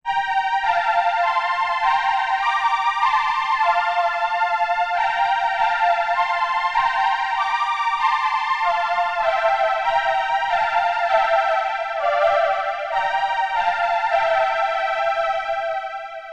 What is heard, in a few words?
echoflanger flanger e310u